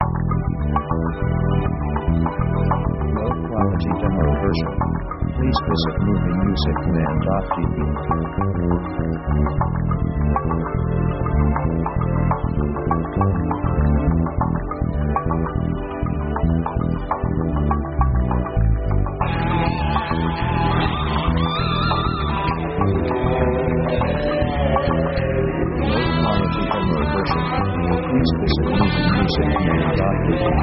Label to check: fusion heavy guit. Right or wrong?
Right